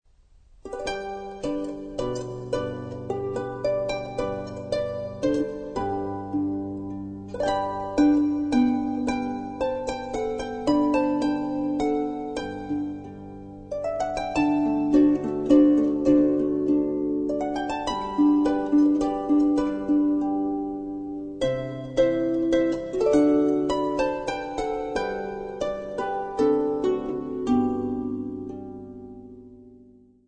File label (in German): Fest-Menuett